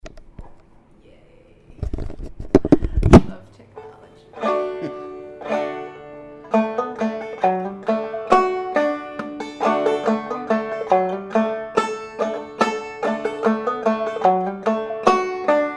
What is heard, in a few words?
banjo